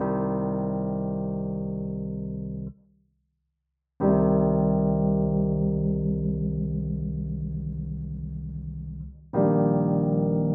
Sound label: rotary; aava; viiri audio